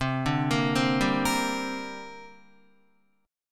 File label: C 7th Suspended 2nd, Guitar; C7sus2 arpeggiated; 8,5,8,5,7,5